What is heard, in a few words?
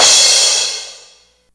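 DMX crash; classic machines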